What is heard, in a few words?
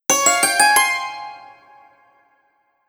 arabian mystery harp notification; mixkit